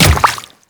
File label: Grineer Royal Guard Water Step, Footsteps